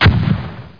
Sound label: bomb